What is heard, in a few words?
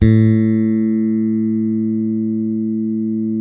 bass, sustain; Sampleheads; Will Lee Bass Library